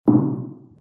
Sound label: Hammer Hit